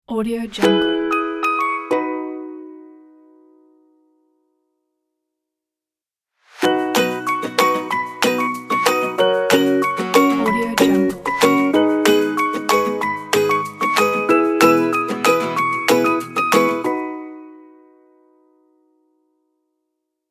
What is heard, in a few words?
happy brand logo music pack